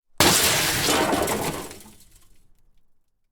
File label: glass shatters into debris